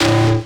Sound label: High Tom; Percussion; 99Sounds; Dark Engine; Burnt Hits